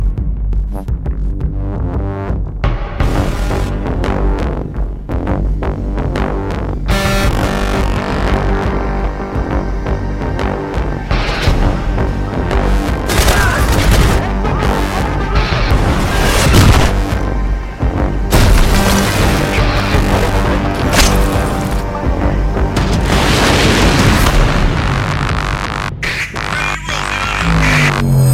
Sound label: fight